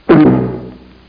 error; animwave

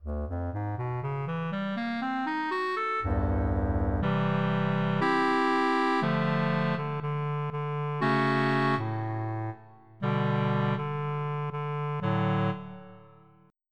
clarinet; Clarinet 1

